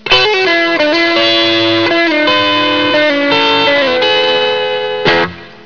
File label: lick